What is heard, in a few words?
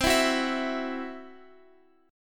C-Major Flat 5th, Guitar Open F; strummed